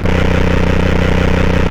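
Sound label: RUMBLE left, Hard & Loud Techno; USB Soundscan vol.01; AKAI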